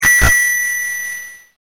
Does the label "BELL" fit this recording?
Yes